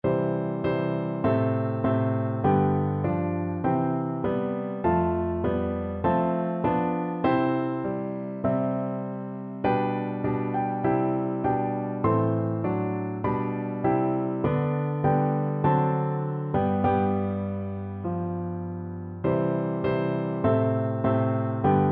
holy holy holy, piano duet

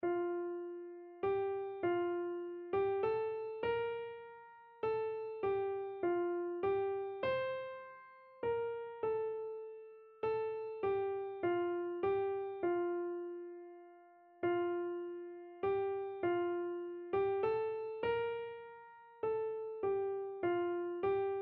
love divine, keyboard

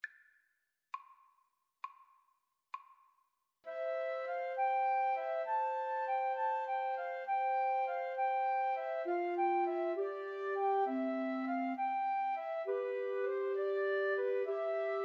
pastorale flute oboe clarinet

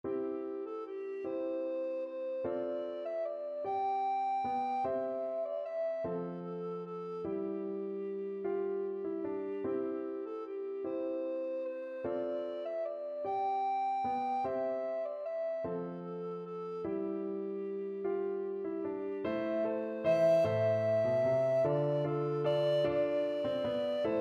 skyeboat, alto recorder

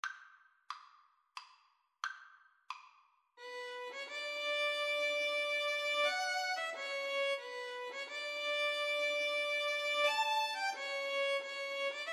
Violin Cello Duet; brahms sym3 mvt3

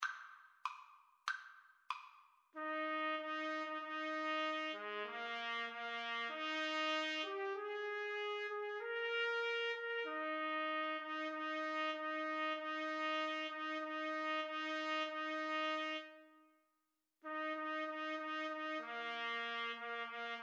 trumpet duet

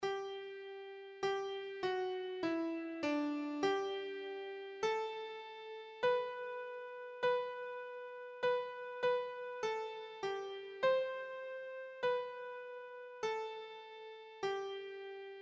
all people that on earth, keyboard

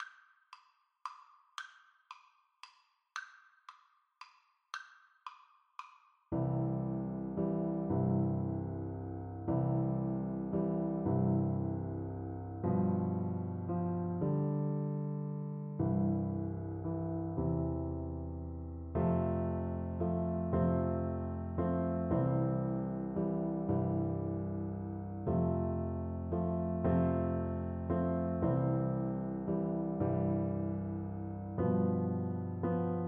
silent night violin piano duet

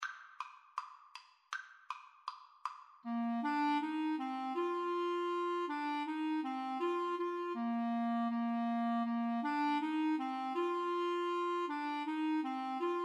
ding dong, Clarinet Duet

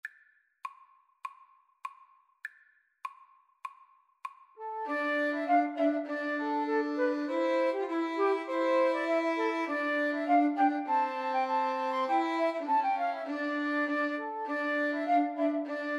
flute violin cello

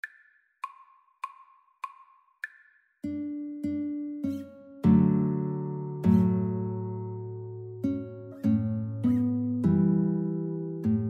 Guitar Duet

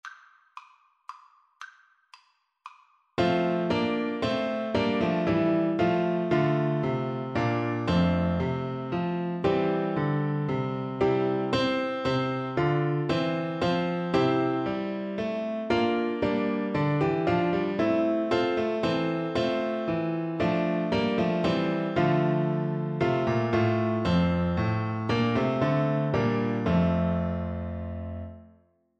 be thou my vision, Tuba

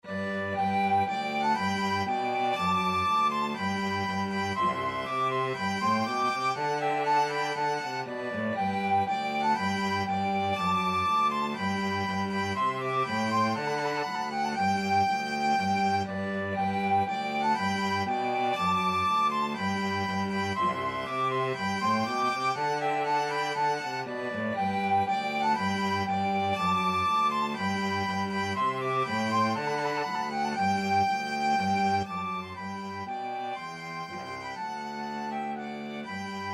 charpentier te deum, flute violin cello

